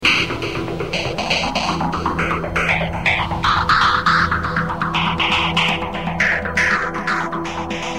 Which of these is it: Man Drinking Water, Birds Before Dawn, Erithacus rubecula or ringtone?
ringtone